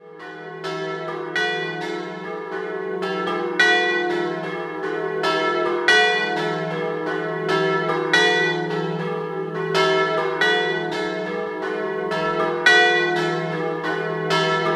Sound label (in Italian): Trava Doppio, campane